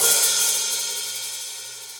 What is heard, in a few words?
Hihat Open Shank; Cold Tight Room; Drum Hits; Standard Hits; Ableton; Factory Packs; Session Drums Studio